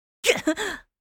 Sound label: Pain grunt hit anime boy vocalization